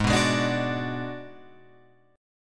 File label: G sharp Minor 7th Flat 5th Guitar Open F, G#m7b5 chord; strummed; JGuitar